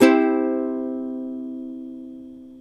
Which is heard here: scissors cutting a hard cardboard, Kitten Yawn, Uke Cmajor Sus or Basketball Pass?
Uke Cmajor Sus